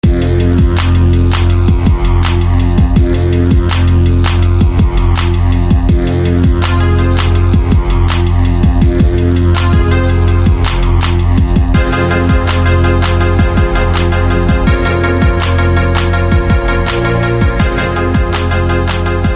Bomb Synth

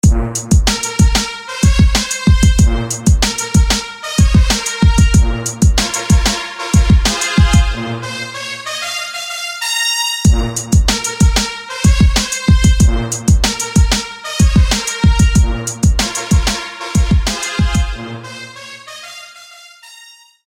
White brass

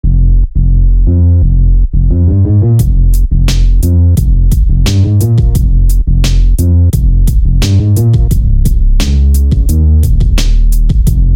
Bass; RuggedThug; SFB FX

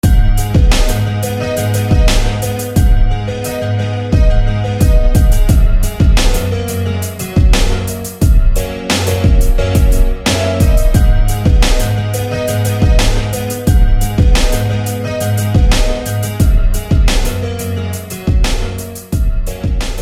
Nylon; Ivory Nylon; SFB FX

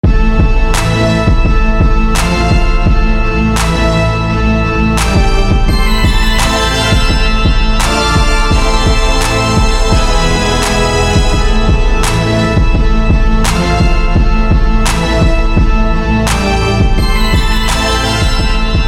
Organ; Satan's; SFB FX

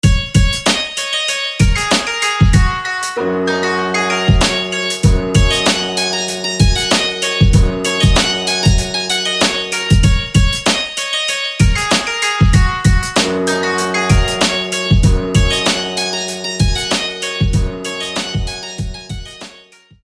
Dynasty Chime

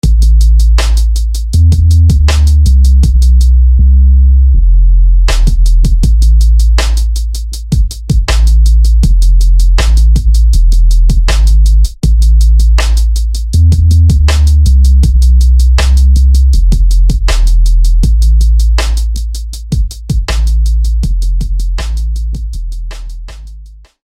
Bass; Bass Bangah; SFB FX

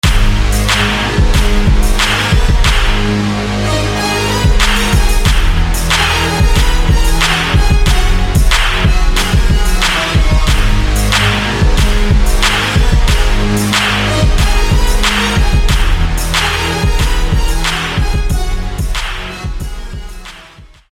Saw; Blitz; SFB FX

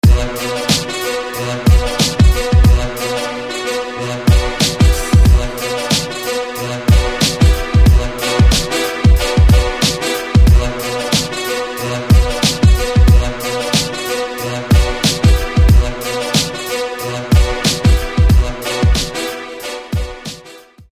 Strong Horn Hit; SFB FX

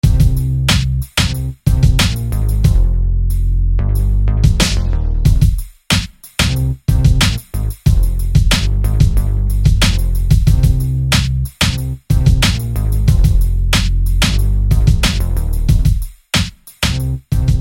Bass; Bass'd Mindset; SFB FX

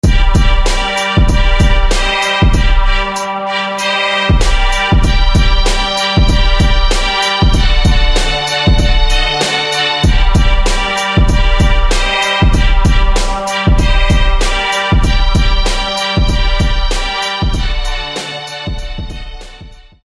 Strings; Razor Strings; SFB FX

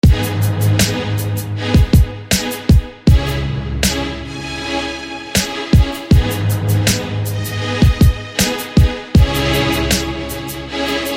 Echo String

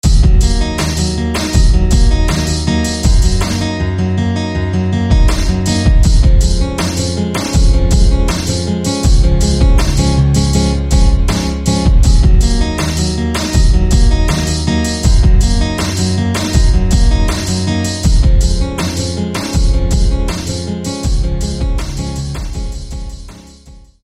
Guitar; Grace; SFB FX

